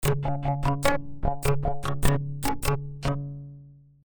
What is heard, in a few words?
ASR 10 16 voices